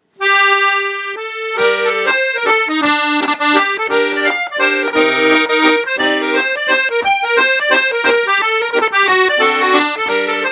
French Accordionist; London Accordionist

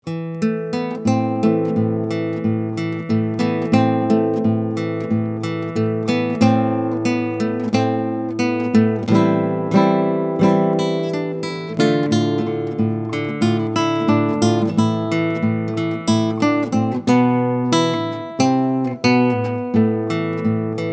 bella ciao, Classical Guitarist

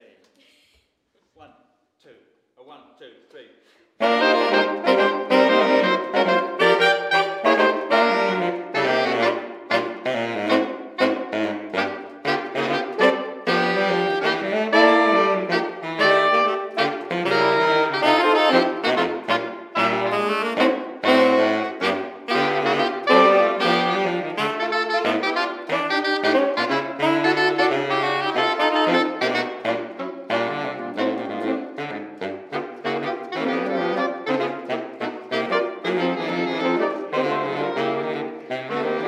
Quartet Charleston, sax quartet

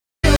Vocal Trio, Soul Band; Buckinghamshire

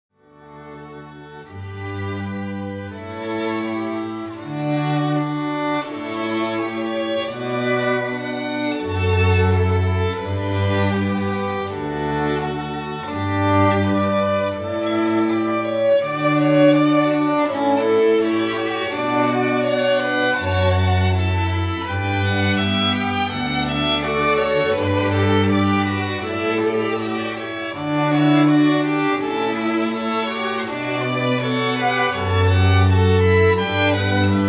String Quartet; London

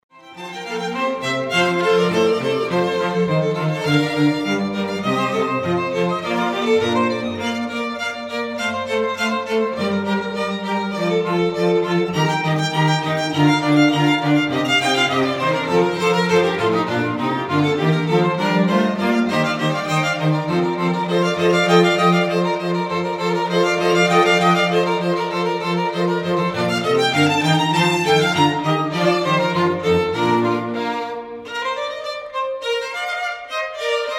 String Quartet; London

